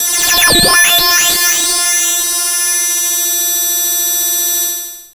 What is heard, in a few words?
FX SWEEP, SYNTHSWEEPS